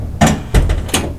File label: Door Close; Inner Demons